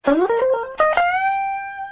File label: lick